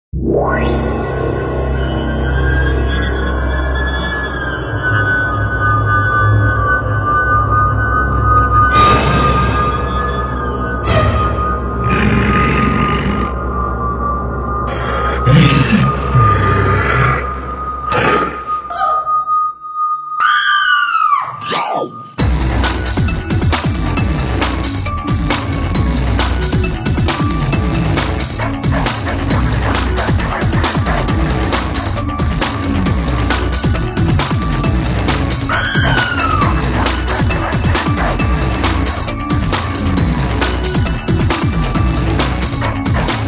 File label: Various horror sounds, growls, screams, shrieks, and music in a sound design montage; Scary Tone